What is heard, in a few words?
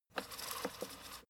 fader plastic stutter soft short